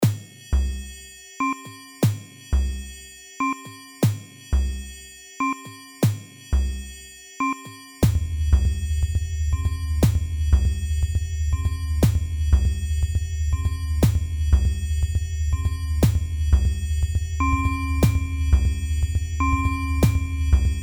Waldorf Rack Attack, Percussion synthesizer module; Minimax / rack attack mix